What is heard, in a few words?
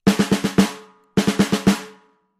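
Drums Snare March Accent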